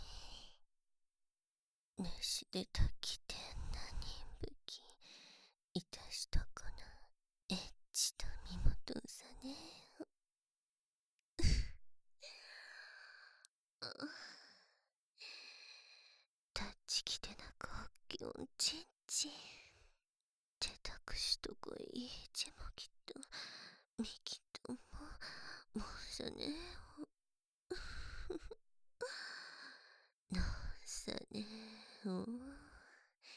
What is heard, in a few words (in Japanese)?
モフモフ髪の毛クンカクンカ耳舐め